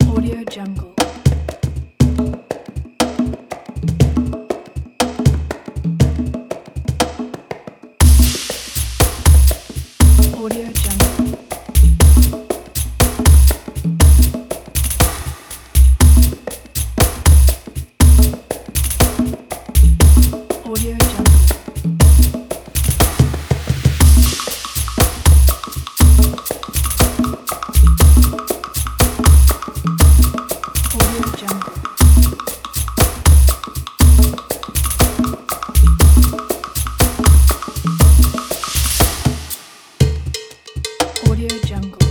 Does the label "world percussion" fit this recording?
Yes